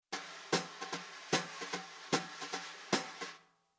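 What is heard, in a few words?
Rhythm 4 beat Brush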